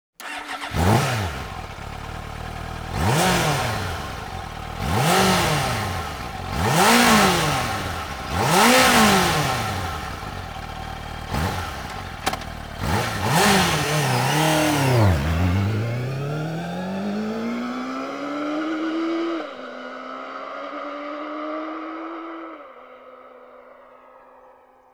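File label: Suzuki GSX-R 750, motorcycle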